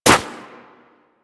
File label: Operator Run Snow, Footsteps; Warframe